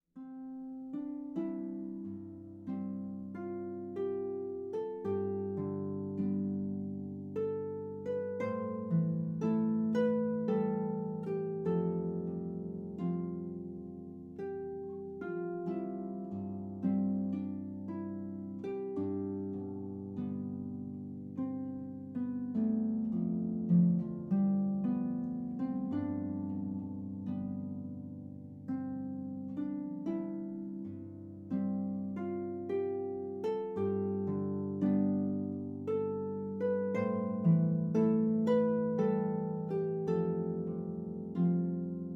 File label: Hymne, harp